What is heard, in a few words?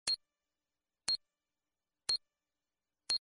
timer slow signal